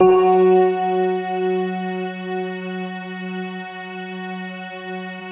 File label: Fender Violin, Fender Stack; Optical Media International; Sonic Images Library